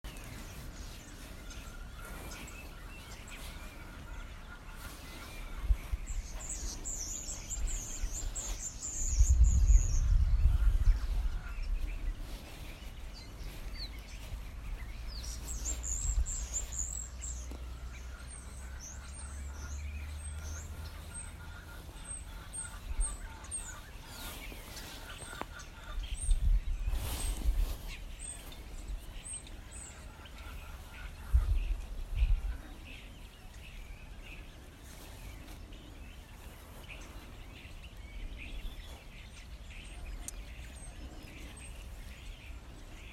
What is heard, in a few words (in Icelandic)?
Umhverfishljod